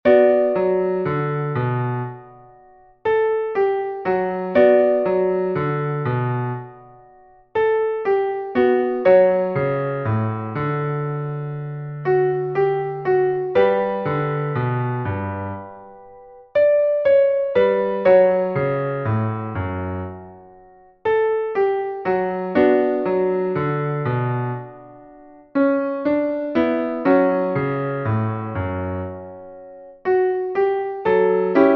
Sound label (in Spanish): entonacion con piano, Piano